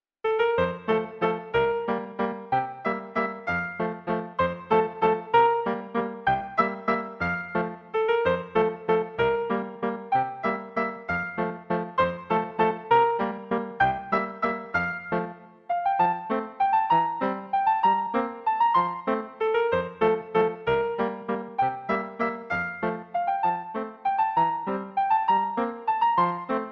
Deutschen fur Klavier piano; Fast spielfertige Entwurfe zu zwei Deutschen

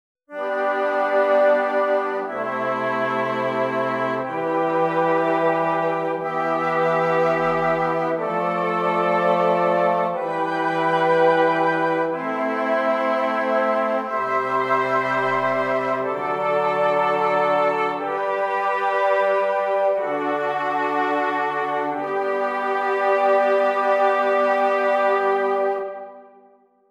Stimmiger Contrapunkt; Ronge; con correzioni

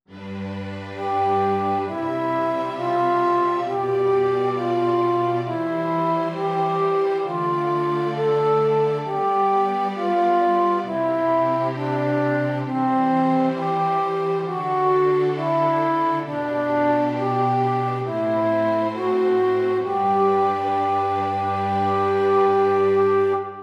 Stimmiger Contrapunkt; Ronge

